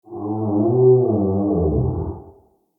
Inside Vocal Groan; Ambience; Bromius

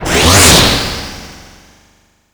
Vay Hek Drone Take Off; Warframe

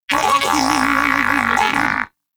Pet Tenacious Cold Shiver; Pets; Warframe; Moa

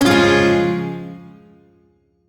Shawzin Phrygian Chord; Music; Warframe; Duviri; Chords

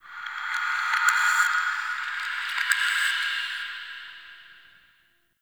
Zariman Metal Whispers; Warframe; The New War; Ambience

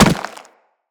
Infested Runner Step Default, Footsteps

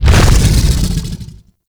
Biped Vocal Death; Warframe; ManInTheWall

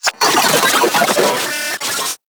Vocal Death; Moa; Vocal Set D

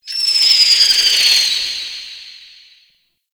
Hazard Spark Projectile; Gameplay; Stadium; Warframe; Nineteen Ninety Nine

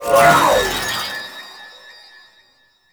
Whirlwind Speed Activate; Warframe; Caliban

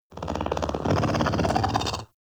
Pet Purr; Warframe; Catbrow; PetShip

